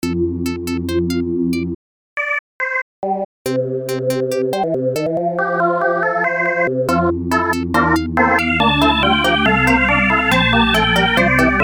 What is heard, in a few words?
dumb melody